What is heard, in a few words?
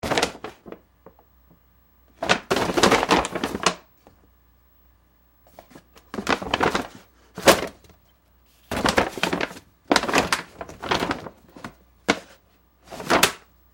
Foley Shopping Bag Paper Moves; Materials; Big Room Sound